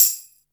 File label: XV Perc, Roland XV-5080